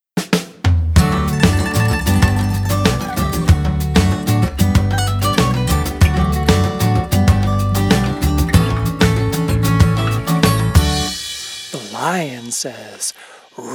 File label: Lion Cubs Play Instrumental